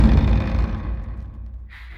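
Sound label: Music Volcano Light 120; From Dust; PS3; Xbox 360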